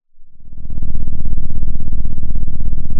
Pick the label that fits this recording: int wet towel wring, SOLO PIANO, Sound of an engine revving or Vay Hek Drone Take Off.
Sound of an engine revving